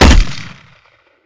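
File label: grenade launcher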